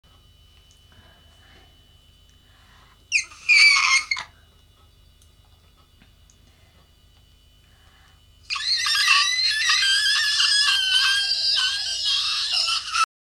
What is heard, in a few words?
tiger thrush